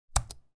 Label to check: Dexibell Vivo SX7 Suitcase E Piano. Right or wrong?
Wrong